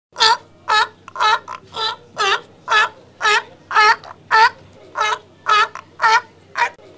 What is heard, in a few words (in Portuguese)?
barulho de foca